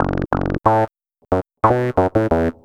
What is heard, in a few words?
Squibble, Bass; Sonic Foundry (Sony Creative Software); Synchro Funk